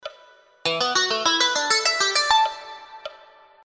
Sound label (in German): SCALES Quinten